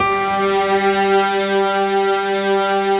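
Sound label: G 3, PIANO STRINGS; Best Service; Advanced Media Trax Modern Composer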